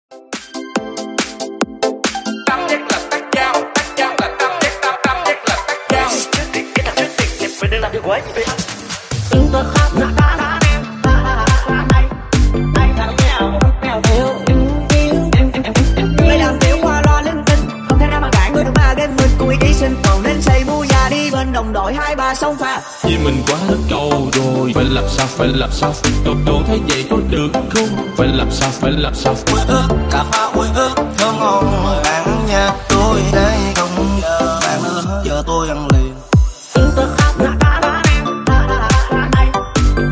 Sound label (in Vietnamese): Nhac chuong mashup remix